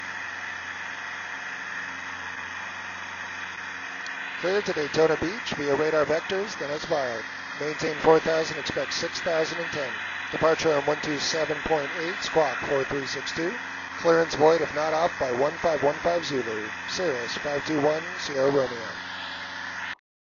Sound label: Cirrus SR20 Bose A30; Zulu 4; sound comparison